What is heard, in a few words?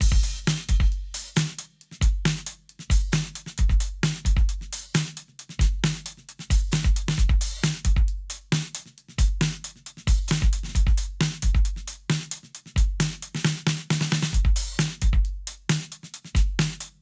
Premier 134 bpm, drums; Basement drums vol 1